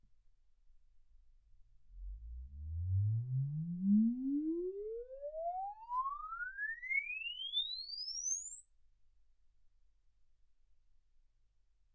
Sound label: exp swept sinus 10Hz 24kHz